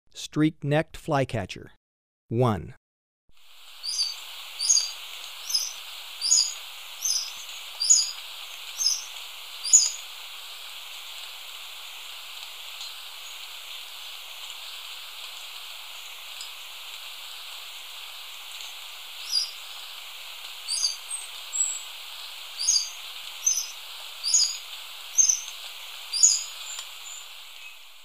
Streak-necked Flycatcher, Birds of Ecuador; S. America

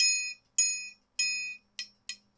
triangle 100 bpm, latin; Sound Effect Library

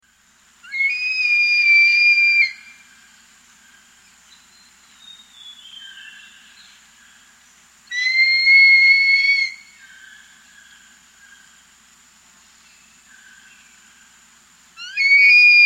Great Black-Hawk; S. America

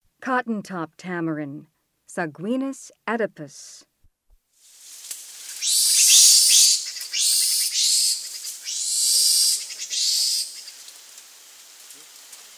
Cotton-top tamarin; S. America; Rainforest

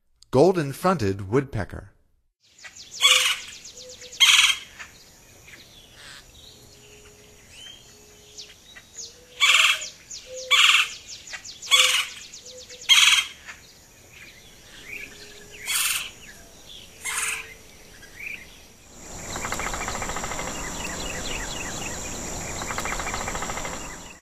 Golden-fronted Woodpecker; North America; Stokes

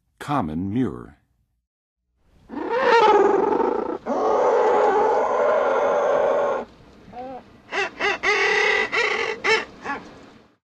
Common Murre; North America; Western USA; Western USA Songbirds

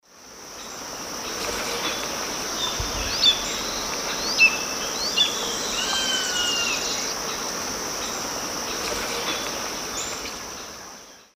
Buteo albonotatus, flight calls; S. America; Eastern Lowlands

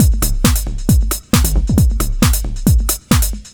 Drums Techno

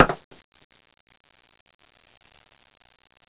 CORK POP

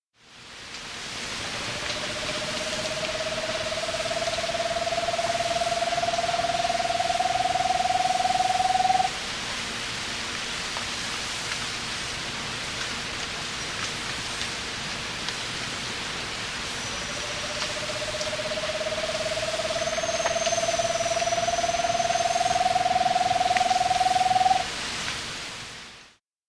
Giant Antpitta; S. America

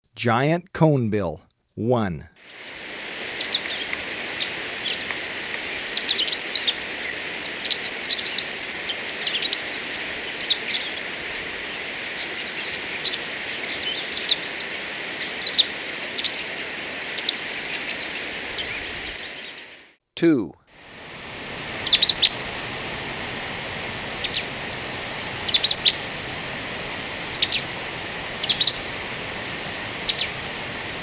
Giant Conebill, Birds of Ecuador; S. America

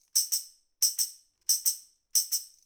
Knickle Tamb; Rock; Percussion

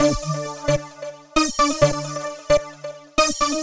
Lead Synth; Dance; Synths Lead

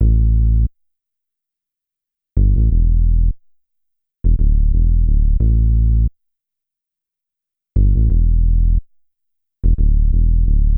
Bass Hip-Hop

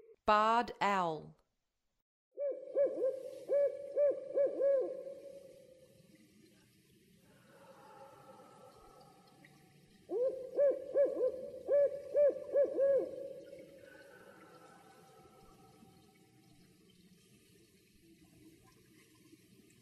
Barred Owl, Songs of Birds of North America; Western USA; Western USA Songbirds

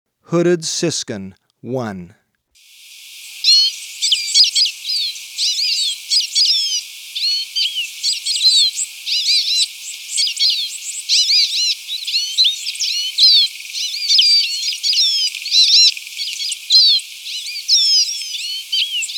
Hooded Siskin; S. America

